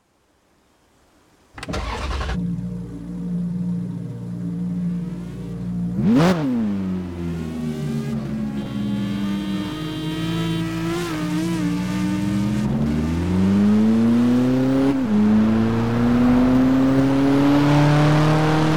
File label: ferrari 458 italia; tdu; 2009